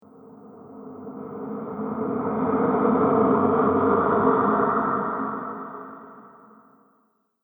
Gruesome Ghost Monster Discharge; Andrew Fleming

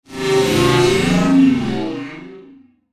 Whoosh Robotic Swirl; Blastwave

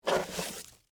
Rope Pick Up, From, Wood, Surface, Heavy, Rope

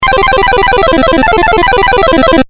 8 Bit Style Arpeggio; Video Game Sound Effects

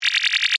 Scanner Beam Ray, Scifi; Short, Science, Fiction; Tech Sound Effects

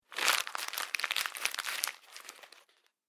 Crunching Plastic Wrapper Noise; Crinkling Sound Effects; Foley Sound Effect

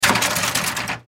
Ice Tray Out, Ice Tray Pull From Freezer; Food; Household

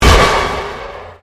Convolution Pulse

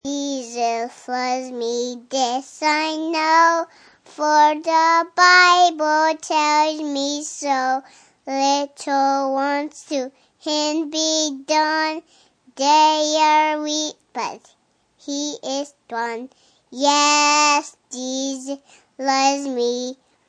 Kid Sings Jesus Loves Me, Kid singing; Children; Human

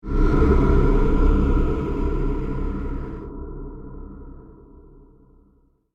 Horror Low Blow; evil

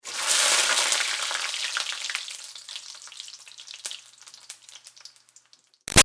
Food Sizzle Oil French Fries Splash Quick; Household

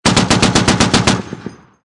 MkII 50cal Burst, Machine Gun; Machine Guns; VRSonic

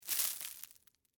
Debris Ice Cream Cone, Soft; Texture; BlastWave

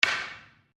Wood On Wood; Material components for constructing more complex sounds; Materials; Foundation4

